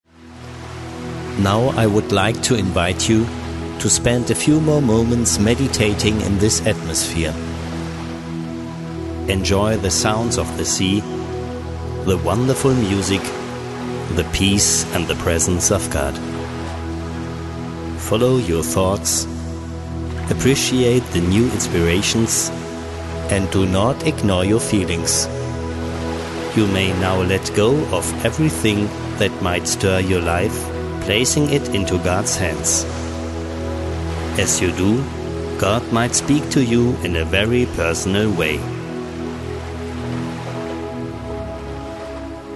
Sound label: Meditation